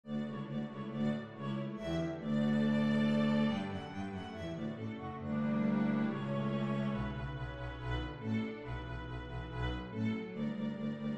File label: nutcracker, string bass